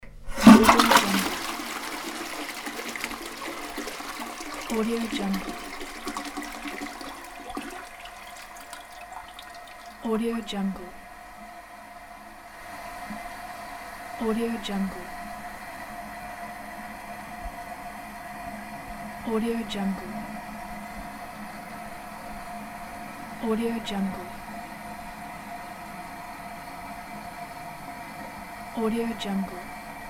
toilet flush

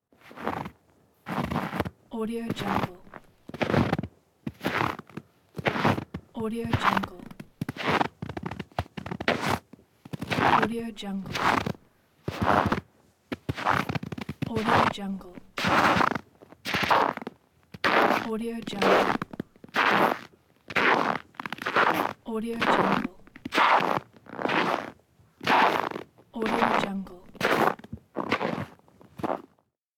walking on snow

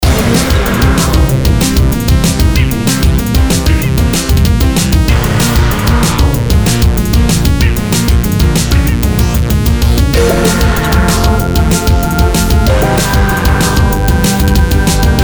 arcade action game

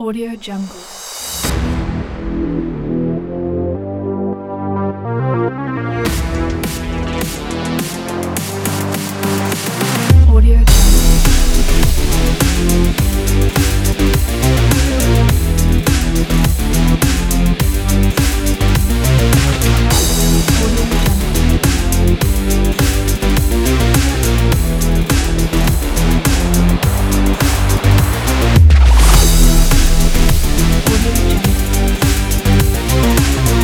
epic action sports

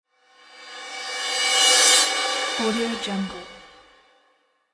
cymbal whoosh

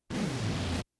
video static distortion short bursts; rizzy; prosoundfx

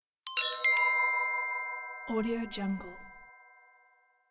magic crystal

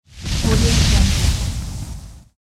fire whoosh

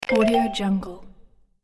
buy item

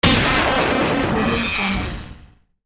piercing sharp attack with modulating noise out

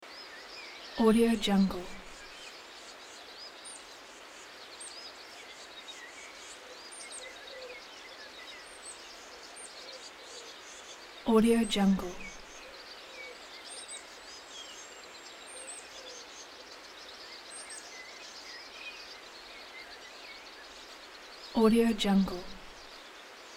calm daytime forest ambience

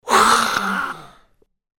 vampire pain hiss

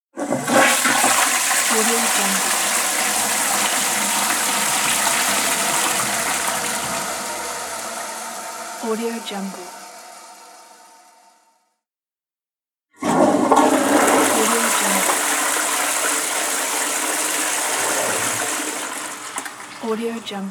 toilet flush

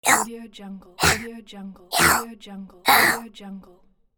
small monster

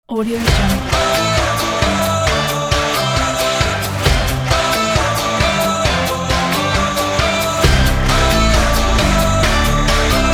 indie rock

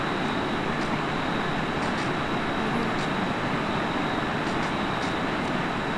laptop computer ventilation loop

